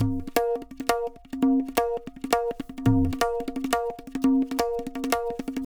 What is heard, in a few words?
tabla, 170 bpm; Zero-G; Pure Tabla